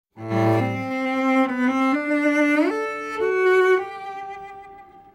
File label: Cello